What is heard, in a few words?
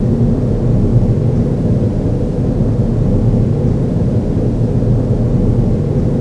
10 forward; trek